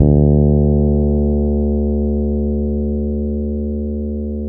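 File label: D 3, fretless, bass; Spectrasonics; Bass Legends CD 1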